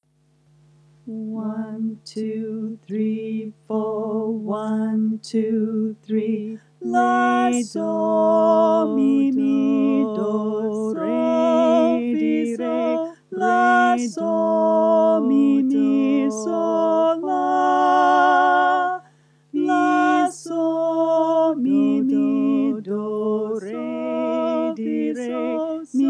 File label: Auld lead and bass solfa chorus, Folk Choir; Auld Lang Syne Resources